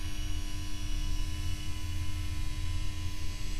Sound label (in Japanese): 異音判定